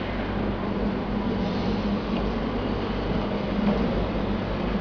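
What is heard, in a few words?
ambience hangar loop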